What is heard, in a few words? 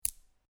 Marble Impact With One Marble; A marble or small pebble impact.; Miscellaneous Toy Sound Effects